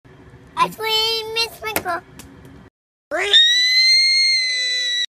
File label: boy drops ice cream